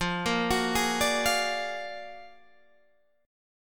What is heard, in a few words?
Gb Augmented Major 9th, Guitar